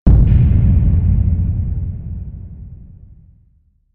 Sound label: low booom trailer song